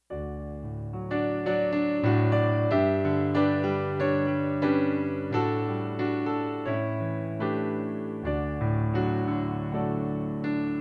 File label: piano